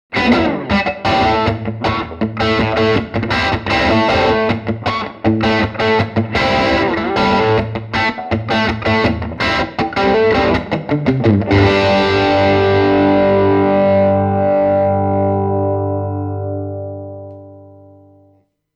Crunch Light UK

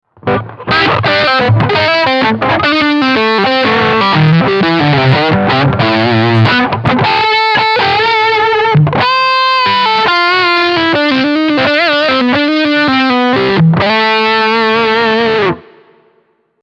Lick Front HB

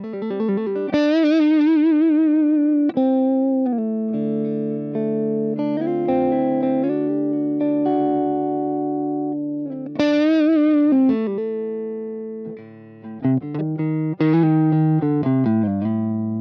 Single Coil; Cort KX-500

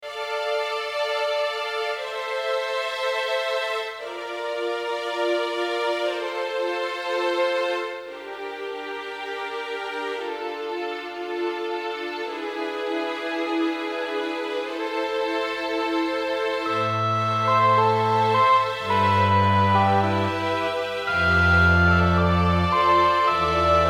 Lexicon PCM70 Concert Hall; Adagio Master

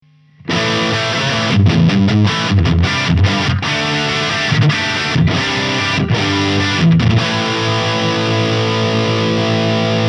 VOX V8; Sound City

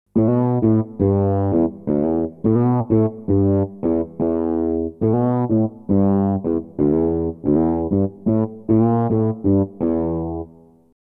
Synth Bass

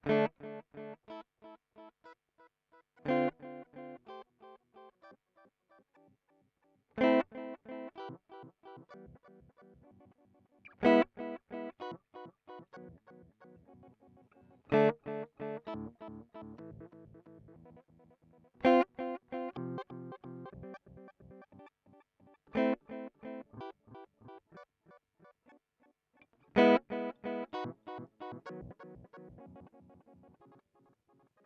Meris Polymoon Late Modulation

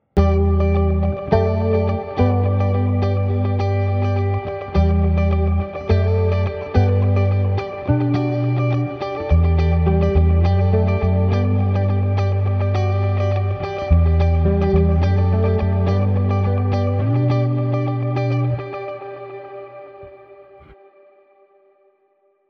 Pop

